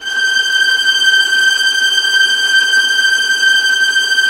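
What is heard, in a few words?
VIOLINT A6, Synclavier Strings; ILIO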